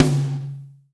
NATURAL M, DRY+FAT KIT

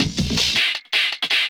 Loop, Drum & Bass, 160 BPM

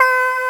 BAHH C3, JAZZ

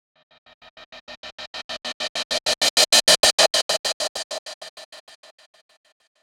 Gated Cymbal; musicradar

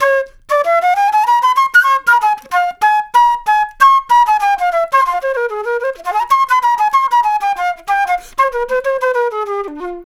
Flute Solo, Jazz; Flute Loops; Sonic Foundry (Sony Creative Software)

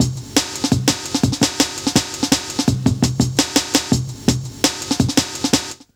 LOOP, Jungle

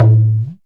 Ethnic Perc, Percussion; Dance Synths

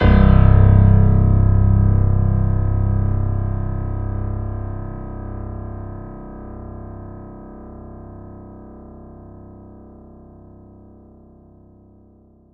C1, Synth Line; 90 s Sample CDs; NiceBeats; SynthLine VOL-15

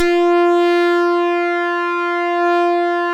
POLYSYNTH; Greytsounds VOL-1; STEIN-PARKER

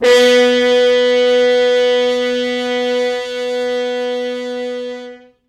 Horns stops

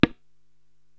Tabla Mute, Korg TR-Rack

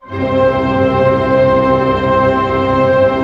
TREM C PP, orch trem; Sound On Sound - Peter Siedleczek's Orchestra

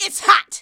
VOX VOX; Sound & Vision - Gigapack II CD 2

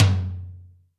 tom, Zoom MRT-3B

